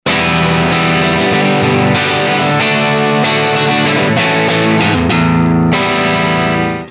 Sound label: Org Stroke